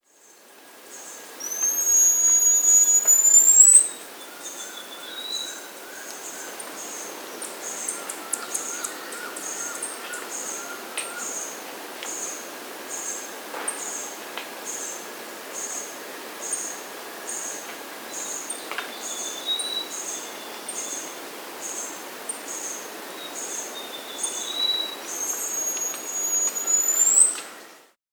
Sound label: Masked Fruiteater (Pipreola Pulchra), Andean Birds; S. America